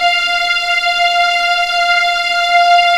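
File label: F5 legato F L, string; East West; The Ultimate String Collection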